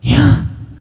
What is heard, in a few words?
shadow attack